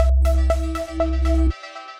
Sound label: Digital Murmers, Synth Rhythmic; Ableton; Glitch and Wash